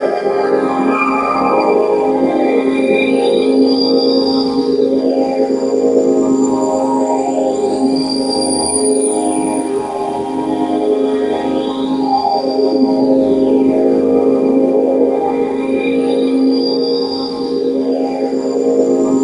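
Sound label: DIRTY JUNGLE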